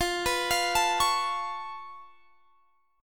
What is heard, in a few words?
Db Augmented 7th F